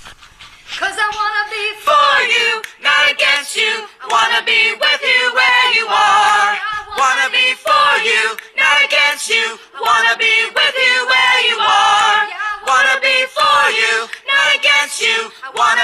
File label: baptize soprano